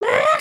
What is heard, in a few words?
chicken cluck long; emotes